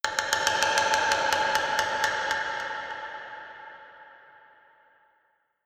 Percus; Koloro

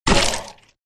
Sfx Enemy Lancer Dead

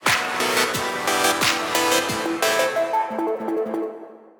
BOOM BOX Jingle; WrestleQuest

